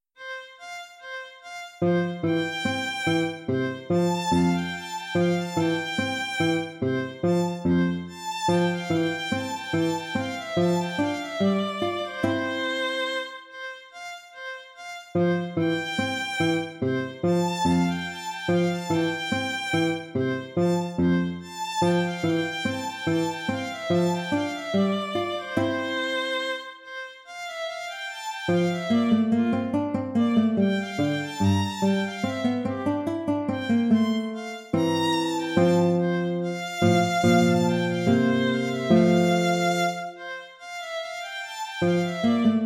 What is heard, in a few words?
gavotte; corelli arcangelo